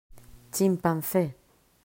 chimpance

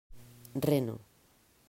reno

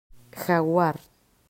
jaguar

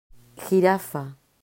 jirafa

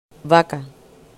vaca

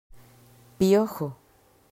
piojo

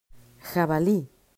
jabali

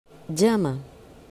llama

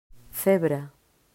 cebra

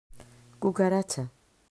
cucaracha

